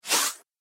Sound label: Subtle Whoosh Fast Zoom; Science Fiction Sound Effect; Andrew Fleming